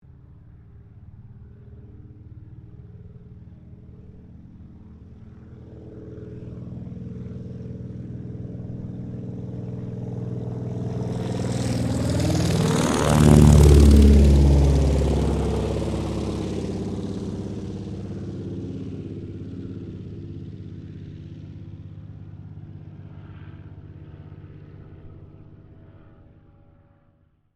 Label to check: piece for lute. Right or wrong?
Wrong